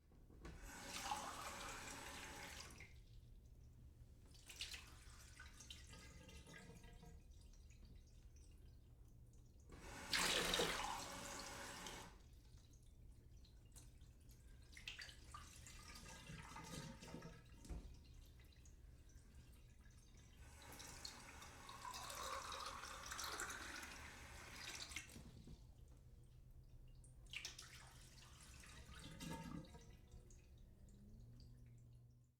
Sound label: glass fill at sink